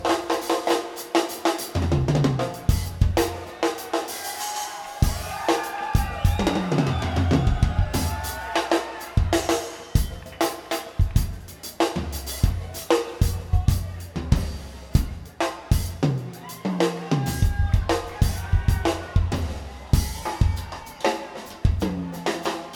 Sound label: Drums; The Bluebird Nightclub Bloomington IN USA